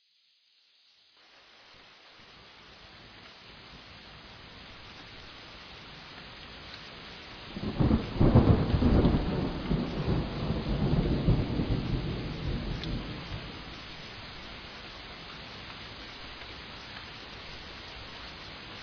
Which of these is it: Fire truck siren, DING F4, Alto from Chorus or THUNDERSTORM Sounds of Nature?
THUNDERSTORM Sounds of Nature